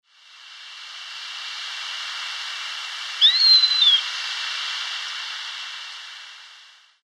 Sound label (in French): buse a gorge blanche